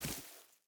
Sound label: footstep rubber boots grass run; Rust